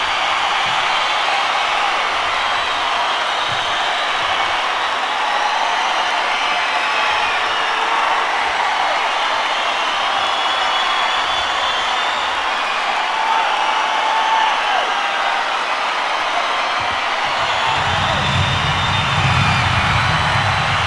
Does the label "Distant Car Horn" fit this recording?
No